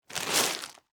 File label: Foley, Plastic, Bag, Rocks, Pick, Up; SoundEffects-BlastWave